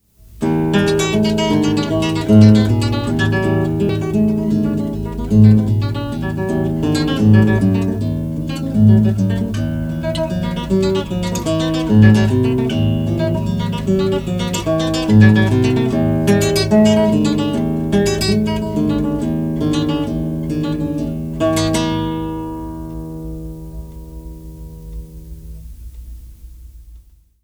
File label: plays Etude, guitar; Brouwer